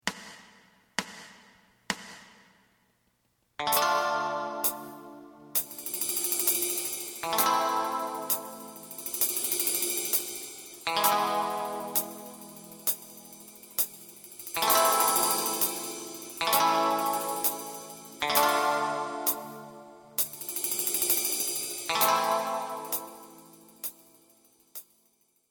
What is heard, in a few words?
lead